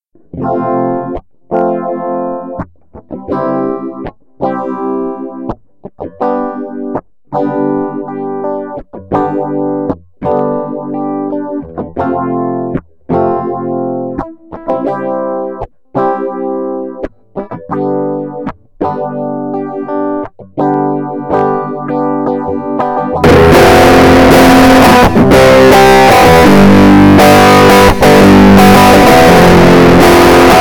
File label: phase